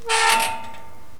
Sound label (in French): porte metallique; Feed The Beast